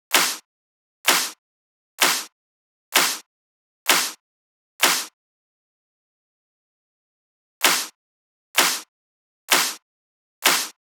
128BPM Clap; Vengeance - Essential Clubsounds Vol.5; Blue Eye Kit; Root G; Drums & Perc

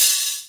Hat, Ensoniq ASR-X

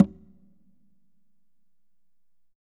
CONGAS WET

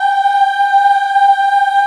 G4 child ah, choir; USB Soundscan vol.28; Acoustic & Synth; AKAI; CHILD AHF

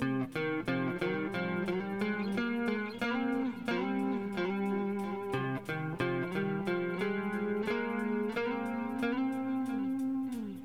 GTE, Hip Hop and R&B; Best Service ProSamples vol.02; AKAI

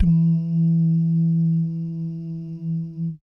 BASS DUMMS, Vocal Planet Jazz FX; Spectrasonics

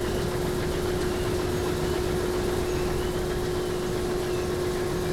ski lift; E-MU Producer Series Vol. 3 – Hollywood Sound Effects; Science Fiction

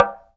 TALK DRM, Heart of Africa; Spectrasonics

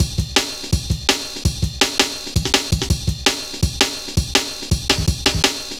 Rollin' Ride, Jungle Warfare, Drum Loops, 165.5; Zero-G Jungle Warfare 1, 2 & 3

